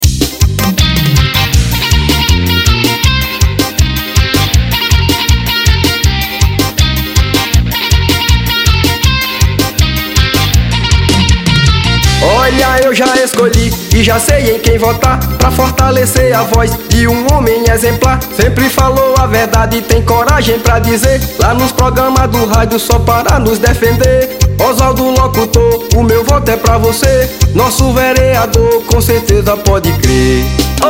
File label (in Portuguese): jingle lambadão